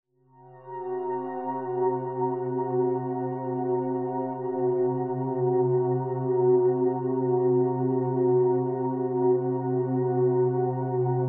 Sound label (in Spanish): Loops mutantes